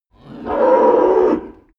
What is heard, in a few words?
cow moo single distant